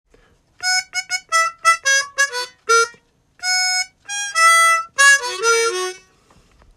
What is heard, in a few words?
Harmonica